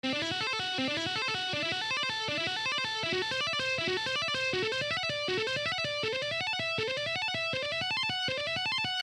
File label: Am6 Licks, guitar